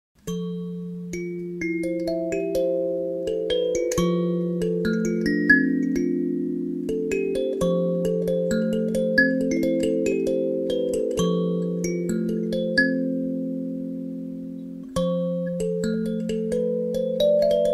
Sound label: Diatonic Kalimba Walnut wood; Orech